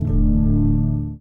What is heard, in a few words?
Gong Harp